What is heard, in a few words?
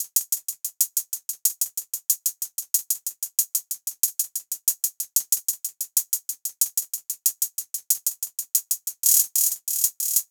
hihat loop dirty 93